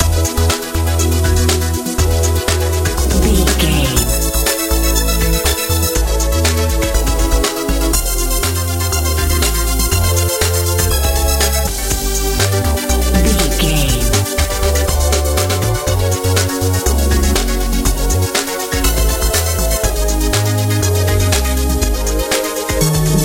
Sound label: techno